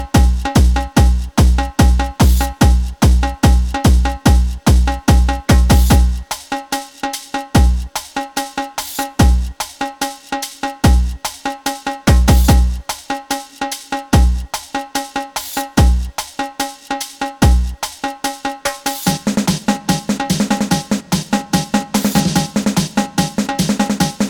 Drummer

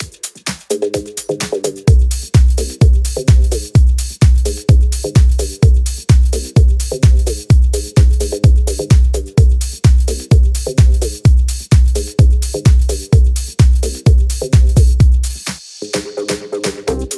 Acid Party

